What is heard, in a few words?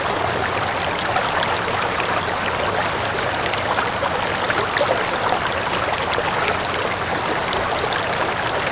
water run